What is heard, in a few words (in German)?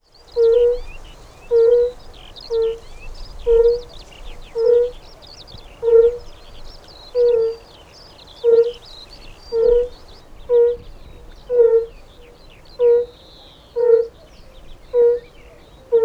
Rotbauchunke Paarungsrufe; Dornburger Alte Elbe; sachsen-anhalt